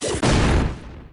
Take Down; Pokemon Essentials; Pokemon Iberia V1.03

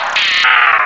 Cry

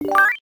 GUI storage show party panel; Pokemon Essentials; PKMN Estelar 1.3

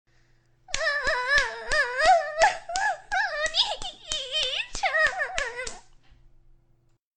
Girl Moaning with slaps; Onii-chan; Anime Sound

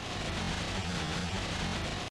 tux on snow; tuxracer

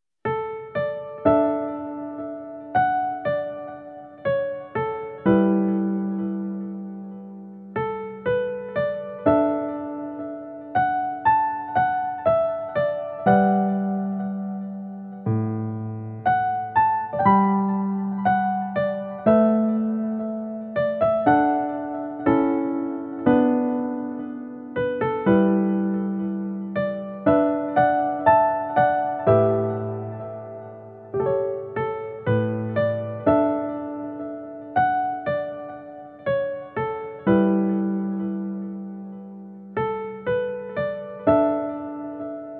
Spring Wind piano; Clannad

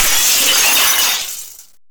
glass break; easyrpg; WitchsHeart

